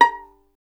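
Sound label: STR violin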